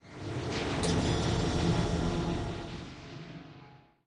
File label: Mineshaft Elevator Travel; lethal company